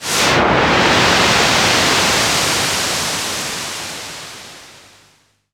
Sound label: Synth FX; Jungle Warfare 1&2